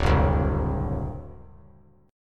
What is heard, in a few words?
D 7th, down; D7 strummed; JGuitar